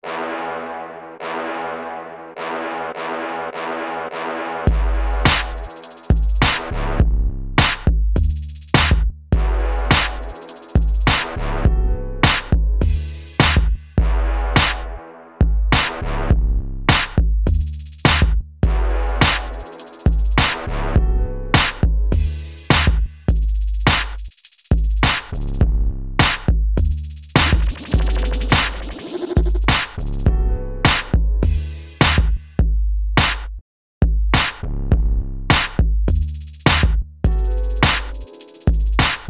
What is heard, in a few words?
Instrumental